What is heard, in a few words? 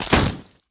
Web Shot; Lurker; Evolve; Gorgon